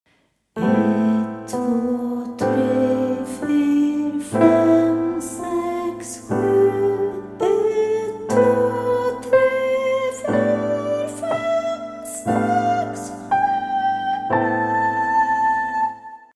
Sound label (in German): SATB Sopranlage